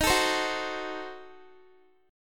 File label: D sharp Diminished 7th D sharp, Guitar; D#dim7; strummed; x,18,19,17,18,x; 4E,4A,5D,5G,5C,6F; chord shape